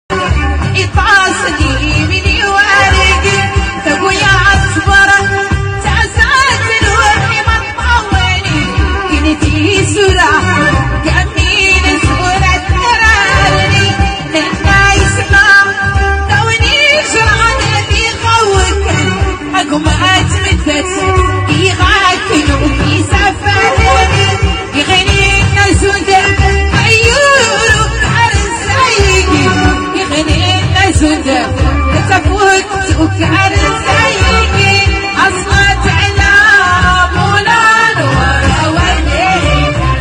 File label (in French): chanson femme souss